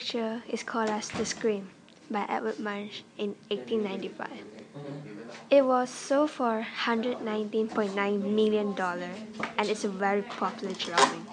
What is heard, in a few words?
The scream